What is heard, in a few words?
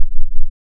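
ring modulation sin tri, silence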